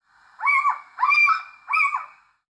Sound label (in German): Steinkauz; Vogel- und Naturschutzgruppe Watzenborn-Steinberg e. V.